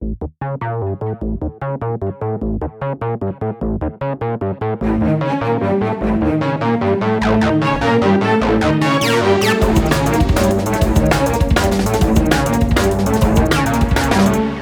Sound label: boss a normal head 100bpm